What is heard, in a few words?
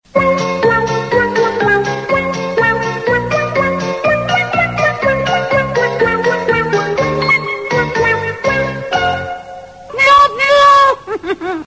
theme cute ringtone